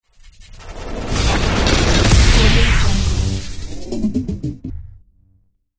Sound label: impact glitch hit